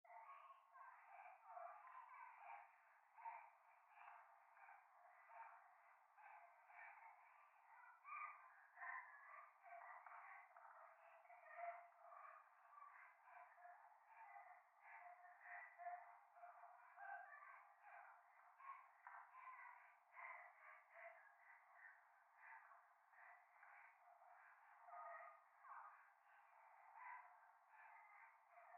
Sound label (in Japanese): 夢の中 可愛い喘ぎ 電車